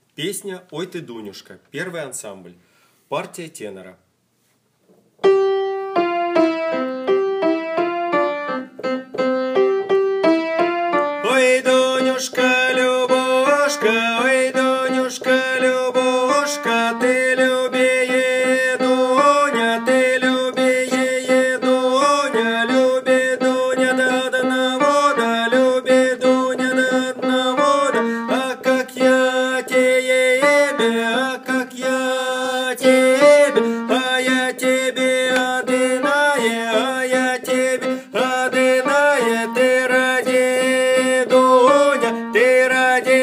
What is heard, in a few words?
Tenor; Oy Ty Duniushka